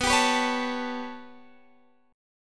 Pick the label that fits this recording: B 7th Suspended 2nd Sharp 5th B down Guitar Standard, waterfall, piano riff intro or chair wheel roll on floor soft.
B 7th Suspended 2nd Sharp 5th B down Guitar Standard